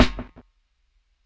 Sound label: SNARE GHOST, DRUM N BASS KIT; JUNGLE BEATS